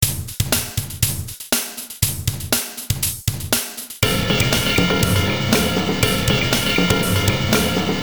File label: depressing rock music